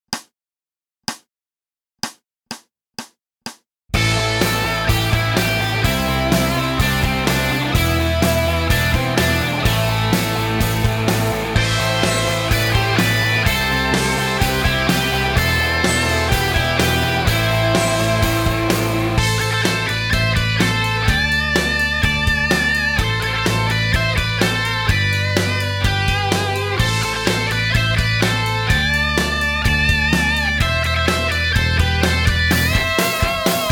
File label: backing track; preliminary grade